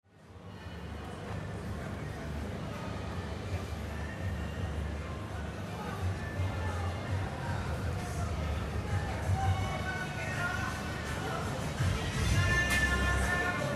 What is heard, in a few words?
ambient music passing; syria street